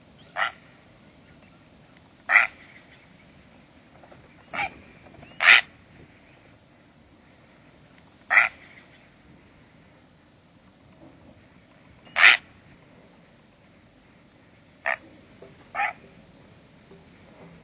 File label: Great Blue Heron; Birds